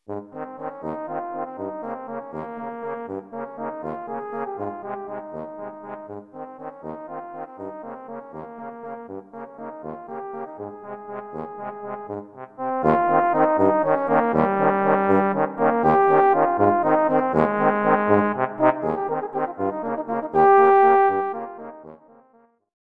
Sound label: Italian Air